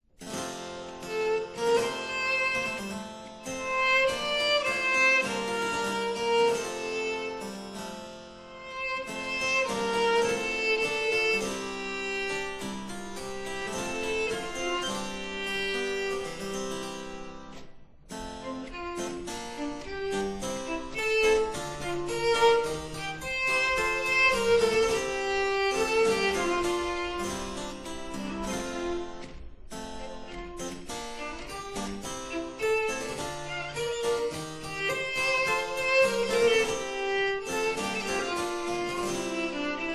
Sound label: baroque; dowland